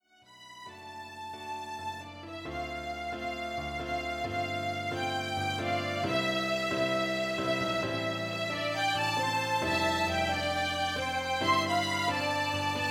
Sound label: RAIN for violin duet